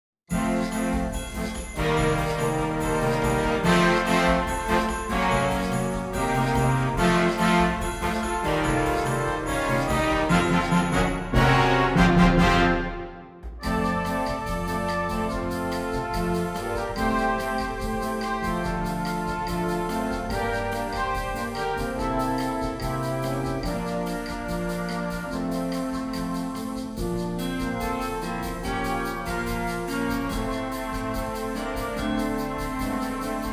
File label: Samba FS Band